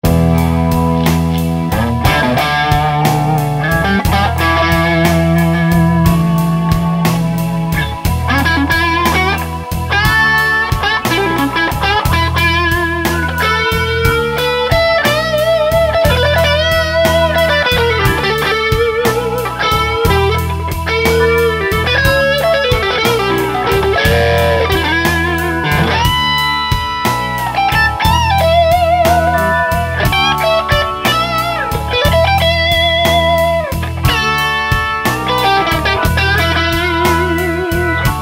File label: P90 Blues; The Rambler top (below) feeds a 2x12" cabinet with Celestion Century; Vintage speakers; Fulltone OCD - High peak on, gain 9 o'clock, tone 12 o'clock; Clean preamp, Bass 12 o'clock, treble 2 o'clock